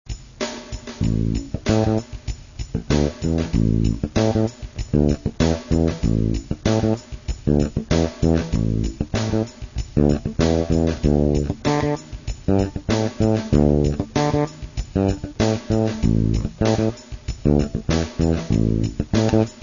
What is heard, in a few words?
funk, bass; grooves binaires